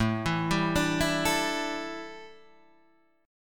A 7th Suspended 4th, chord

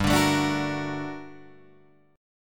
G Minor Major 9th, down, Guitar, Open D

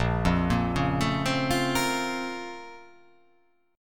A sharp Minor Major 9th

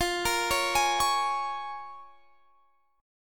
Bb Minor 7th, guitar

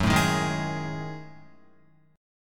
Gb Minor 6th Add 9th Gb down Guitar

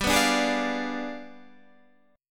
G 7th Flat 9th, Guitar, Standard

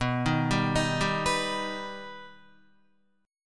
B Augmented B Guitar, chord; arpeggiated; JGuitar

